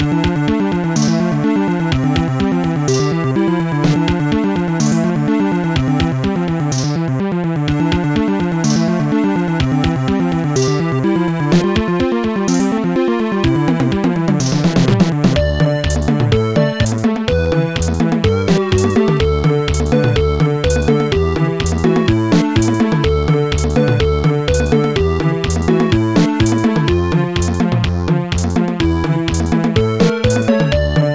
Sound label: Level 2 (Ship); Ivanhoe; Amiga